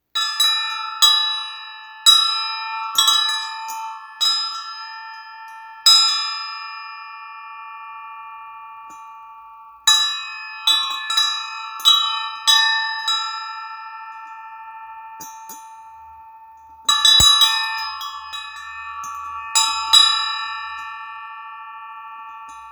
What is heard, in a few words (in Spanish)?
cuencos tibetanos; Roja